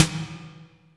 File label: Snares Electro